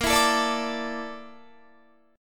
Bb Major 7th Flat 5th, Guitar; strummed

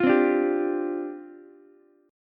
C Suspended 2nd Suspended 4th, down, Ukulele; Csus2sus4 strummed; JGuitar

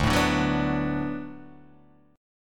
D 6th D down Guitar Open D, chord

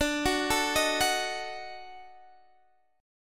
Eb Minor Major 7th Flat 5th

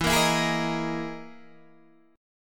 E Major 7th Flat 5th down Guitar Open D

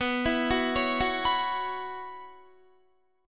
B Suspended 2nd Suspended 4th, Guitar; Bsus2sus4; arpeggiated; 19,19,16,18,18,18

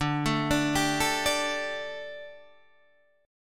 D Suspended 4th D, Guitar, Half Step Down

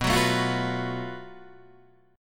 Gb Minor Major 7th Double Flat 5th B

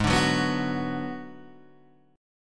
Ab Minor 7th Flat 5th Ab, down, Guitar, Open G

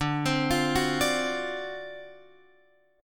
D 7th Sharp 9th D, Guitar Open F; D7#9 arpeggiated

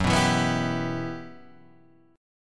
E Minor F sharp, chord; Em/F# Chord {2 2 2 4 5 3} strummed

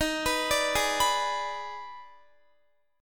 Eb Major 7th Suspended 4th Sharp 5th Eb, Guitar; EbM7sus4#5; arpeggiated

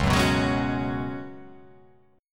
C sharp 7th Suspended 4th C sharp

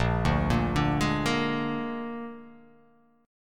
A sharp 7th A sharp

